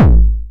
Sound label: 909 BD Tape Sat